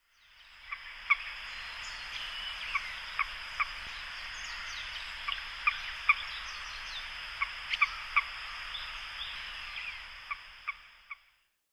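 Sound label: ban, Common Moorhen; hyougo; koe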